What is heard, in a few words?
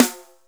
Snare, Akai MPC-500 Drum Machines; Toolkits; Snare TKit